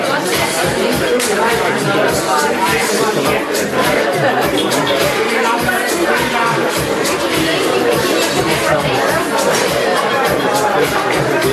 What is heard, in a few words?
bar ambiance